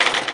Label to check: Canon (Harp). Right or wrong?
Wrong